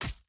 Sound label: hit